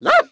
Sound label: bark high